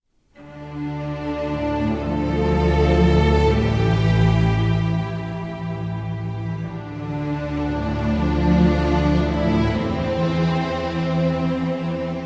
Orchestral

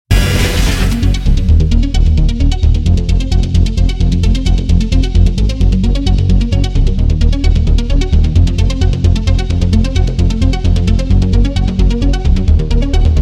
BPM 131

